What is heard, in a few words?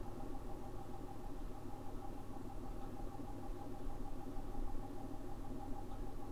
idling